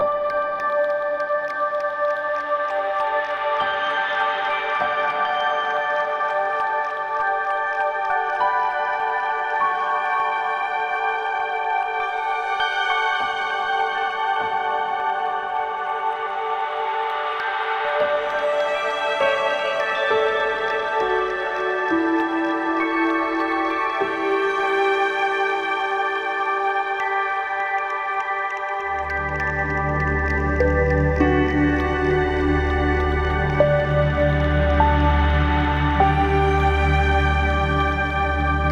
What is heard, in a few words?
Ambient Wonderlust; NWNDS; Ambient music pack